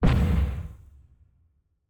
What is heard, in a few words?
Explode; Mountain SFX